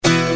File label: guitar